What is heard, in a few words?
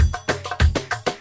happy middle east, DRUM LOOPS and BREAKS, 197 bpm